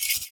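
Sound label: PERC, percussions; Univers Sons - Raricussions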